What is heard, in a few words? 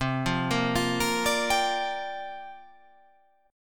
C 9th Suspended 4th C down